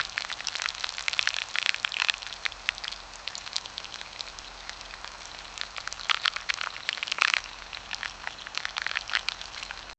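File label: subtle organic plant growing, plants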